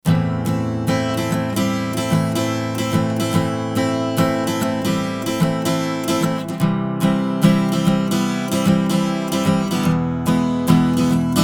Chandler Limited TG Microphone Cassette Western Gitarre EQ B Soft Compression

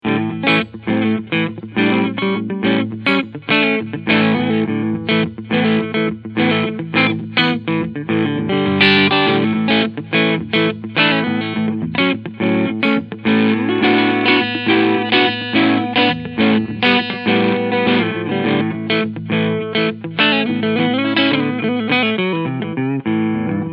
Asat Classic Bluesboy E-Gitarre Front Pickup Clean; GL Tribute

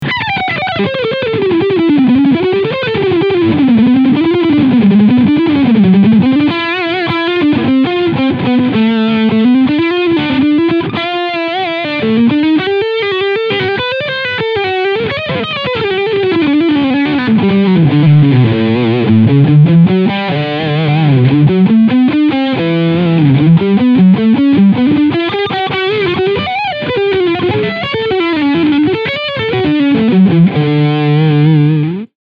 DV Mark DV Little FG 250 Gitarrenverstärker Leadsound

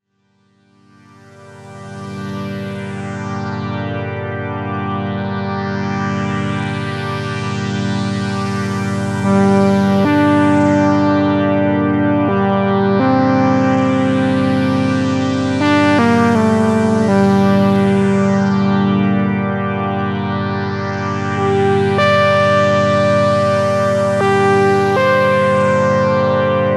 mit Orgel Pad von Nord Stage

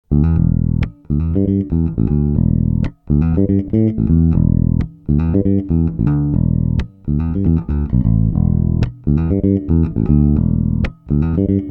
EQ neutral beide Pickups

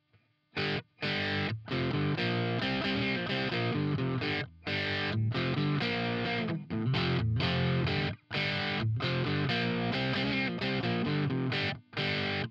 Harley Benton CST 24HB dist SPLIT beide

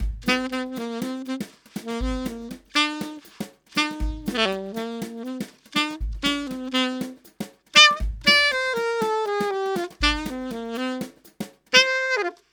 Sennheiser MD Serie MD435 Saxofon Perkussion

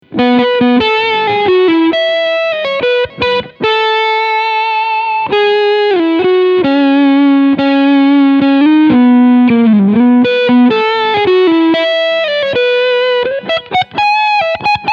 Reverend Guitars Flatrock E Gitarre Beide PUs Crunch Lead

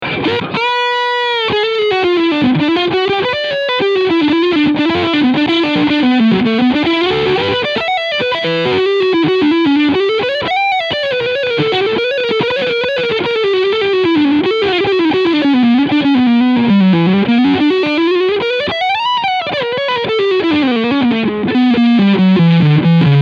Harley Benton Dullahan FT 24 BKS Lead Sound beide PUs Singlecoil

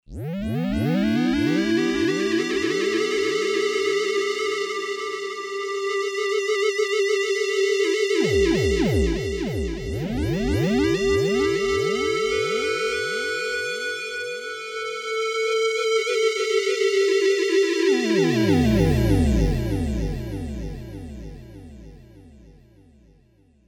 moog theremini square wavy mit pc maj penta

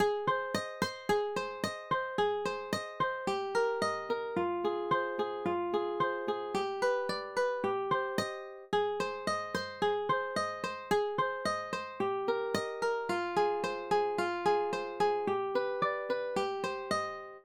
Guitar Pur

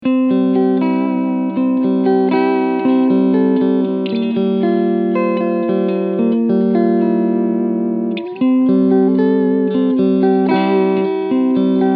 Clean FrontMitte

